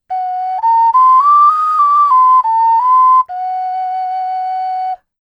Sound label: PreColumbian 4 hole bird jingle, ocarina